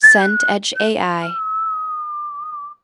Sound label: whistle tune